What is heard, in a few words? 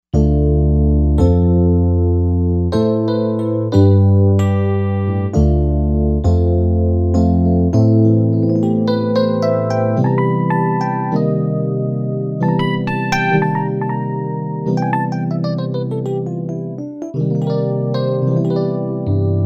Rich EP